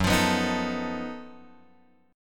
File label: F sharp Minor Major 13th, guitar; F#mM13; {2 X 3 4 4 5} strummed